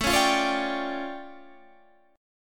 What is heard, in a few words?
D Minor Major 11th, A